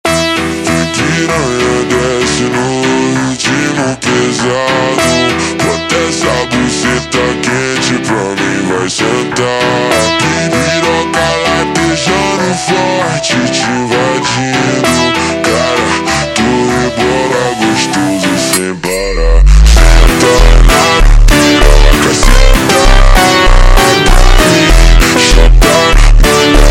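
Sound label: ultra Slowed, phonk music; funk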